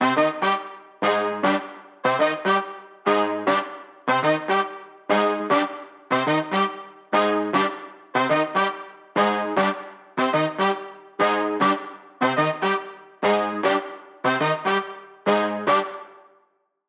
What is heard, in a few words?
electro swing brass